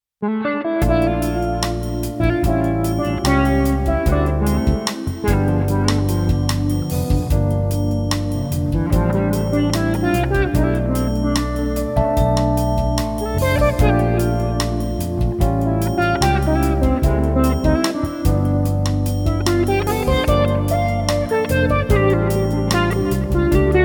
defretter

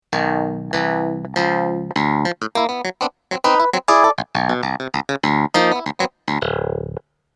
clav

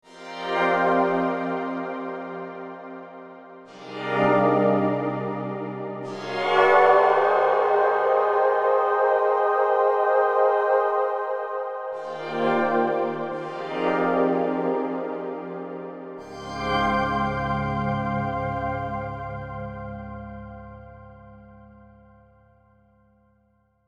pulse pad

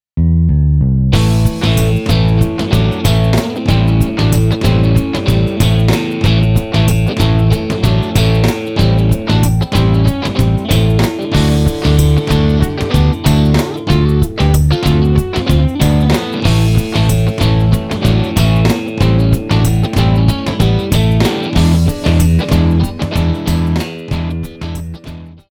patch demo

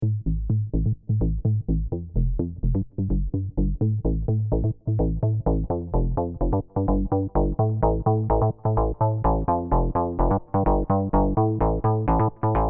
bass synth fm bass

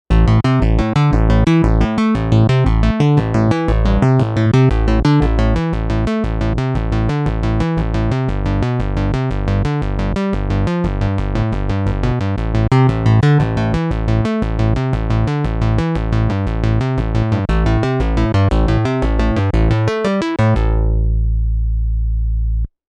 Attack Bass

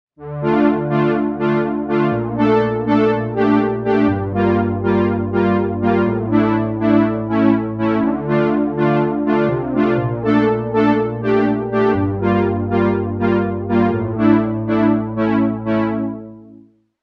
Moving Strings

